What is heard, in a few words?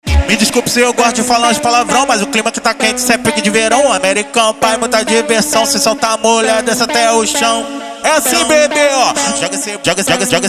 funk